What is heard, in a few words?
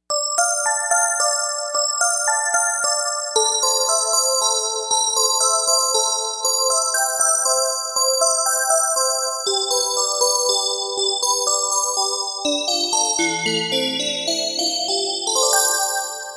Neo Bell, Novation UltraNova; Factory Patches